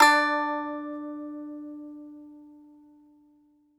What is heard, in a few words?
BAGLAMA, Plucked Strings; E-MU Producer Series Vol. 7 – Old World Instruments